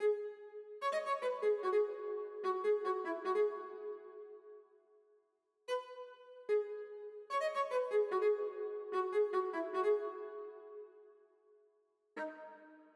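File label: 148bpm Viola Long Spiccato Melody G minor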